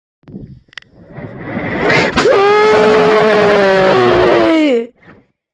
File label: splaat